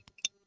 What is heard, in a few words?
hover subtle, ui